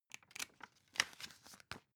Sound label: magazine handle